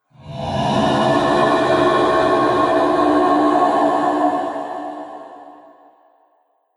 Sci-fi Monster Laser Lizard, alien; Science Fiction Sound Effect

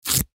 Duct Tape Peel Off Surface Slow Rip; Foley; Sound Effects:Offices; SoundEffects-Blastwave

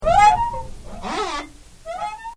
Strange Squeaks; Created sweeps, swooshes and other movement effects; Strange Tones; Sound Design

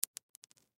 Pill Drop Cement; Drops (Sound Effects:Objects); Blastwave; Foley

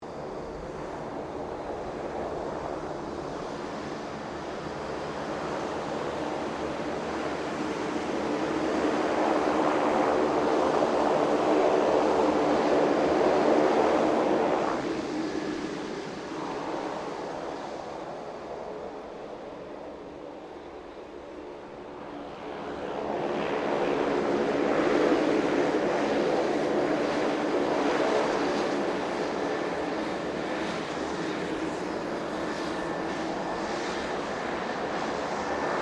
King Air 350 taxi distant; RAAF; Airplanes; Vehicles

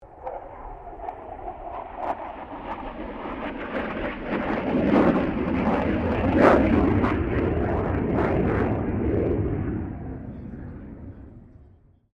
2005 F16 FALCON PASS SLOW; Airplane; Vehicles; Aircraft

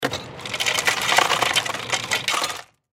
Fast Food Ice Machine Dispense; Industry Fast Food Restaurant Ice Machine Dispense; Restaurants; Blastwave

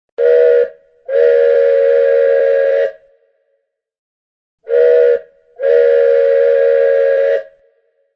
Train toot toot sound effect.; Train Whistle; Toy Trains

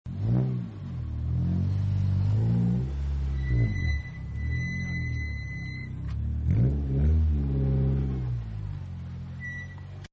Rally Sport Breaks, Cars; Vehicles

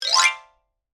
Xylophone Gliss; Mallets; BlastWave

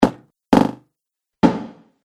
Impacts Dry Delay Reverb; Hit Sound Effects

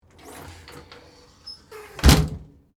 Foley, Door, Close, Glass, Storm, Door; Miscellaneous Doors; BlastWave

